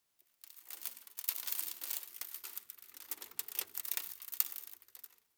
sand auto; ambient; Counter Strike Model Pack; CS:GO; dust2

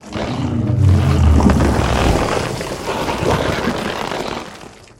bloater swell; infestation; Half Life Alyx; xen

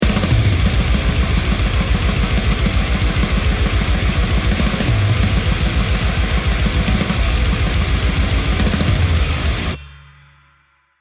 bomb ten sec count, music

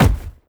human bodyfall soft; npc

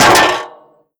vent step, headcrab reviver, creatures

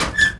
metal door move; doors

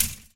chainlink tap; scripted sequences, a2

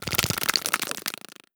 idle clicks, headcrab black; creatures; vox

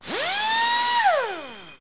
pneumatic drill; ambient machines; Counter Strike Model Pack; CS:S

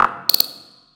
combine mine deactivate; Half Life 2

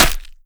flesh, bullet impacts

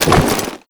foley step, soldier; combine; soldier charger

